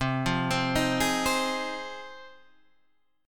Ab 6th C

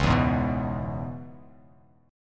C sharp Minor 7th, Bass Standard; C#m7; strummed; JGuitar

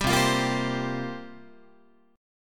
C 7th Suspended 4th, Guitar, chord

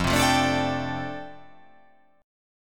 F 6th Add 9th F down, chord

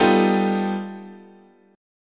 G Augmented 9th, chord, guitar

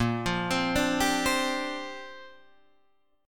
A sharp 6th Add 9th, Guitar Open D

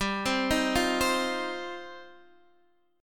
G 7th Suspended 4th Sharp 5th, Guitar DADGAD

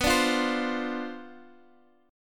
C Major 7th Suspended 2nd Suspended 4th B, chord

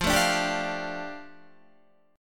F 6th Add 9th F down Guitar, chord

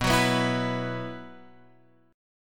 B Suspended 4th, Guitar, Open F; x,6,6,6,7,6; strummed; JGuitar

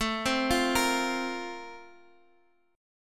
F Suspended 4th A; Fsus/A arpeggiated; JGuitar

